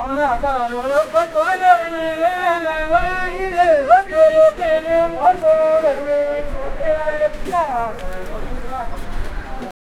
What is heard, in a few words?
ambient sound of traditio, bazaar